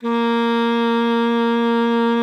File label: A#3, alto sax; Xsample VOL-2